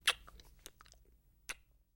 Heavy Kissing, Heavy Kissing With Wet Lip Smacks. - Wet Kisses